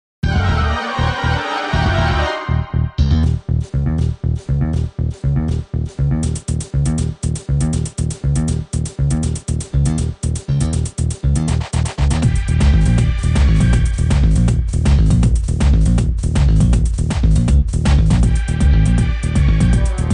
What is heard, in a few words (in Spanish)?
Música de batalla; Pokémon GO Team Rocket